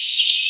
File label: song